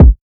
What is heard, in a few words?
Kicks